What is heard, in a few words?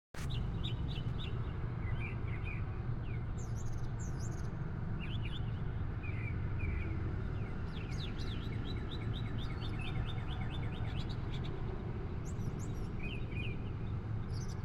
bird songs